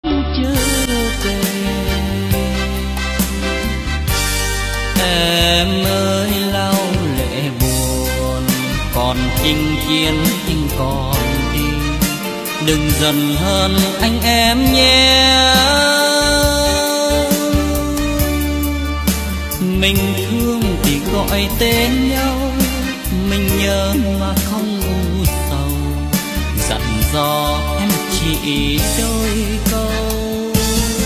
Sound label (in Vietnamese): Liên Khúc Nhạc Lính Bolero